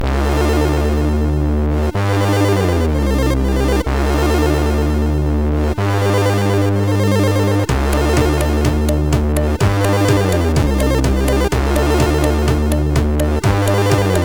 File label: Funky Stuff, MOS6581R4